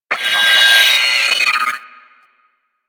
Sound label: Sentient Brachiolyst Death; Enemies; Barks